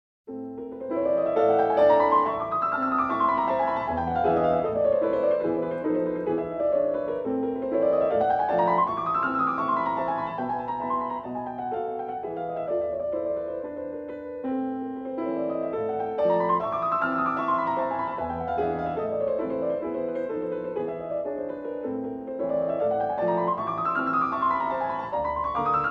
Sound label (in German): Etude für Klavier a-Moll